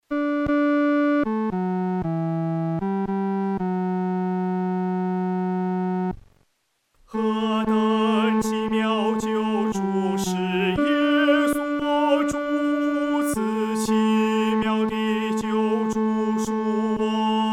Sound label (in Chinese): 独唱 第三声